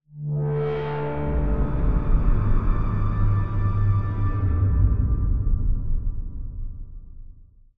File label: Pad, Sylenth1